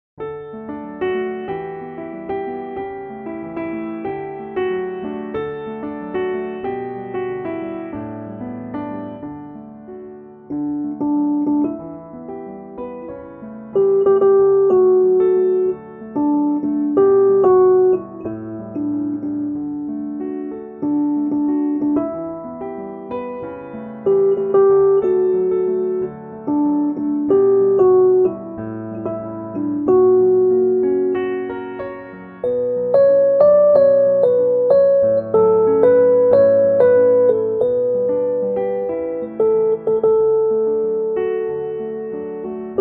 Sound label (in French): instrumental et melodie